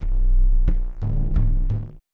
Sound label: Electodjembe; Sonic Foundry (Sony Creative Software); Ma Ja Le Ethereal Textures